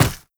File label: step run, footsteps, player, mud